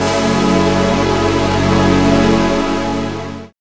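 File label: synth chord; E-Lab; X Static Goldmine 1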